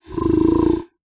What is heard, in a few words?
Animal Mammal Herbivore Buffalo Snort; animals; AdobeCC